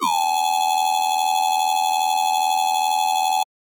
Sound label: Riddim Bass Hit High Layer; One-Shots; Bass Hits; Ghosthack; Riddim Essentials Volume 2